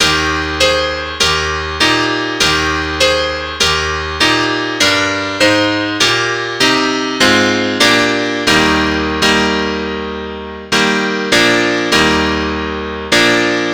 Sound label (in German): Klavier; durch die strassen